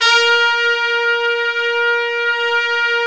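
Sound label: Trumpets Section f, Brass Sections; Roland LCDP06 Brass Sections; Tpts Sec.2-3; Tps Sec.3 f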